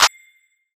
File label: Clap